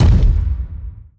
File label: step layer bass; combine; soldier charger